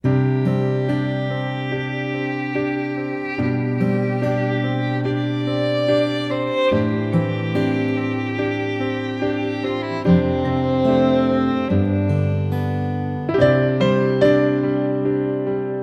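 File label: Background music town; siren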